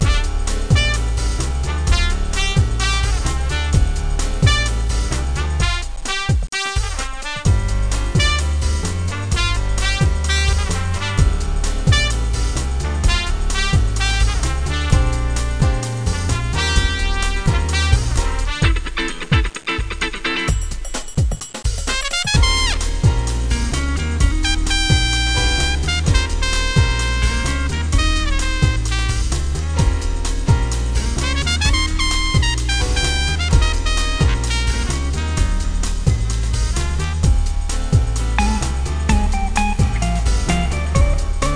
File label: jzy acid